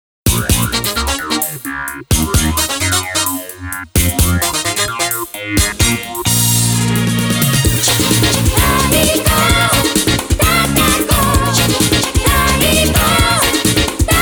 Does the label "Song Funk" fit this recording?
Yes